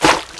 hill wind attack

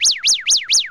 stun

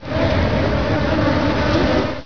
bee

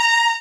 attack

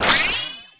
charging pierce

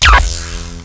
plasma stand

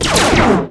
triple laser

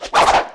root twist

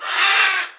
alligator damage

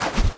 chimera napeo attack

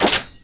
rakehand attack

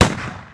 antique firelock attack

